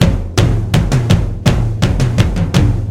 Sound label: drumloop